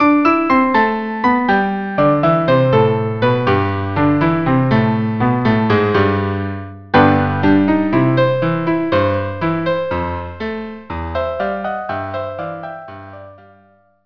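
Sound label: violin cello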